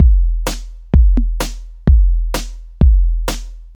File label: low pitched techno kick drum; drum loops